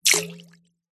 human spit water out into metal bowl short small amount